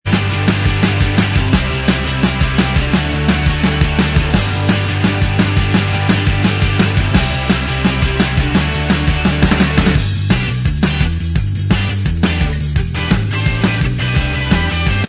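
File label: instrumental, track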